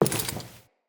Walk Default, Footsteps; Enemy; Characters; Lady Deora

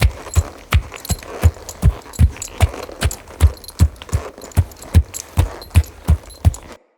Horse Step Walk Loop; Mendicant Horse; Foley; Footsteps

